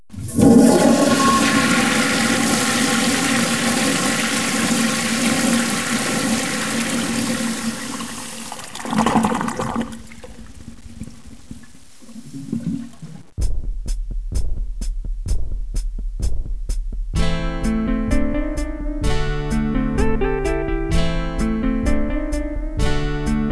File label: WC sound